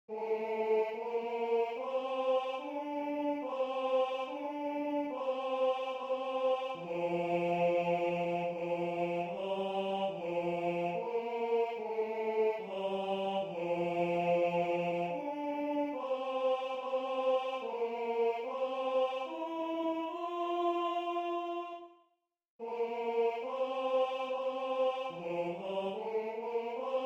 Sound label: tenor